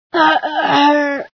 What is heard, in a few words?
scream, female sci